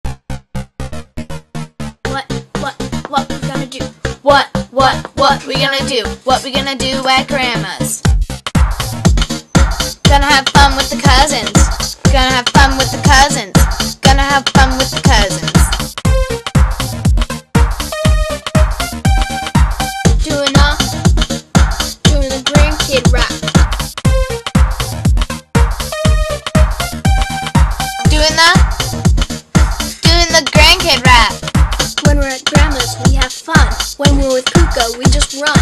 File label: rap